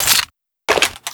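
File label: weapon foley pp2000 reload clipout; Call of Duty Modern Warfare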